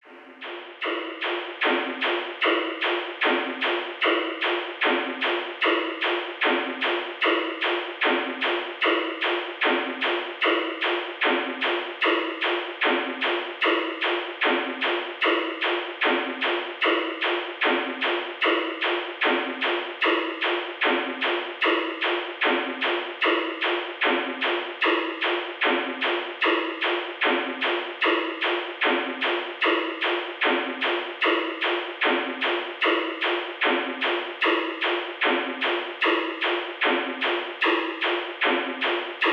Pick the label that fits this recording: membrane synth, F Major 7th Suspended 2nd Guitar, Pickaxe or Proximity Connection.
membrane synth